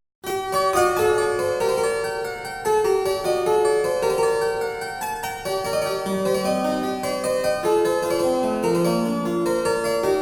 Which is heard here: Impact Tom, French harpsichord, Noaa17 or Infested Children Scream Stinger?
French harpsichord